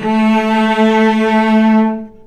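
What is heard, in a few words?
cellos attack A3 R, String; arco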